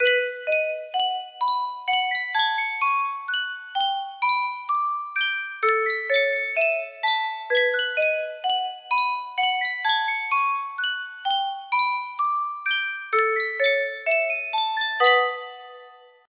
Yunsheng music box

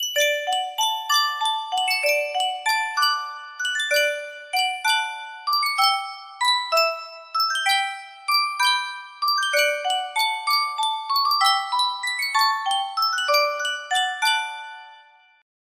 superstar music box

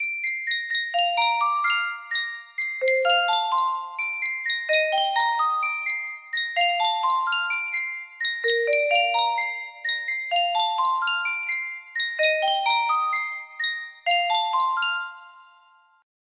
Yunsheng music box

